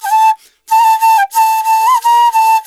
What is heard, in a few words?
ETHNO EXTRA 90; ethno2